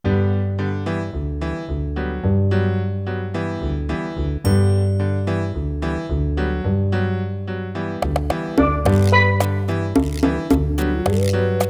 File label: Bongo Cha-Cha